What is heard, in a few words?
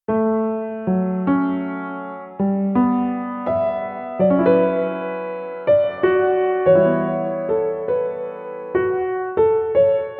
Acoustic